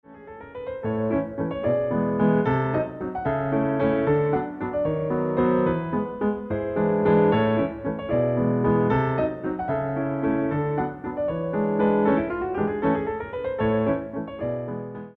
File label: Grand allegro fast, music for ballet class